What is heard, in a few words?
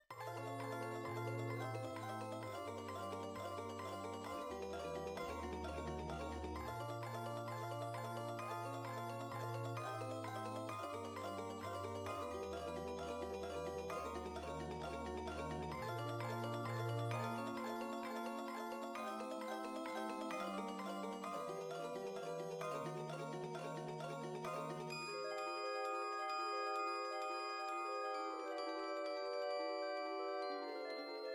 ensemble